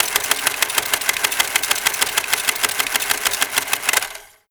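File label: airport departure board left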